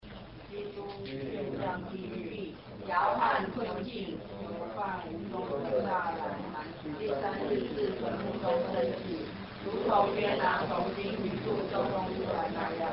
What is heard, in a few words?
Canon Sixth Ode; Pascha 2010